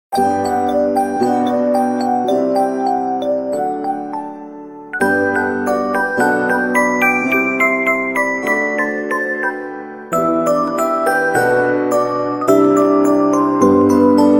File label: Pianissimo